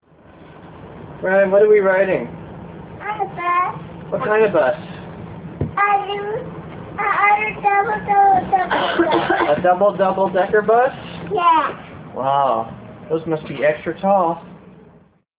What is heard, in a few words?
double bus; scotland